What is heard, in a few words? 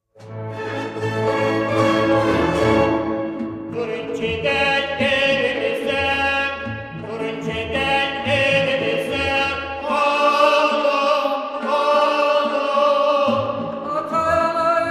trio; leyli